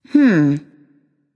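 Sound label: thinking, female; smite; voicepack; hera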